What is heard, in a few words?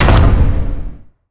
keybind set; ui